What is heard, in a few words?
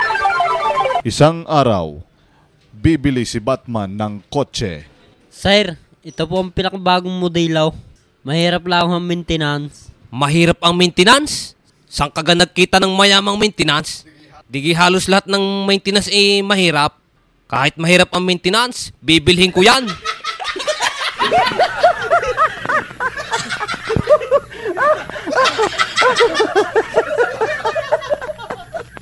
Wahaha